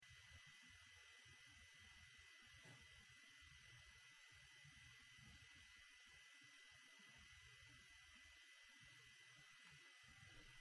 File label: western velociraptor